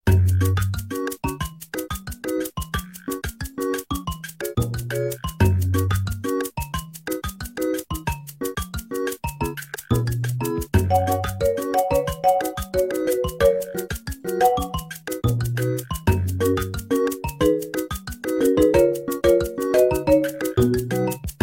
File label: Beach Theme; Pinna Park; Super Mario Sunshine